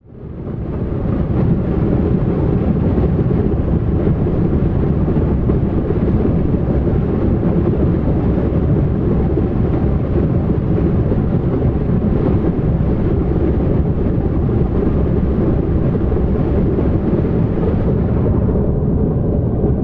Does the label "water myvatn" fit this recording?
Yes